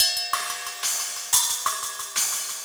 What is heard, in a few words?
Cymbol Particle; Particles